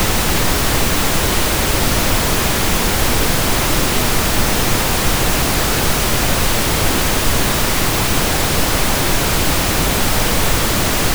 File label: Pink Noise